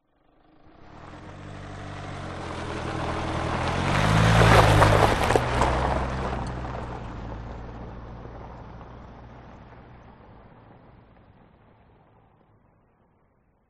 transport car suv holden captiva pull away pass gravel track